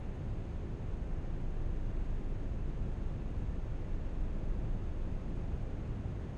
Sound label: room tone heavy; Star Wars Obi-Wan; XBOX